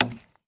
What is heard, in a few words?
Wood Hit